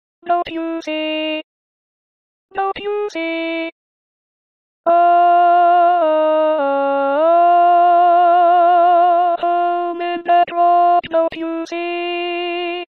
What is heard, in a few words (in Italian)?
contralto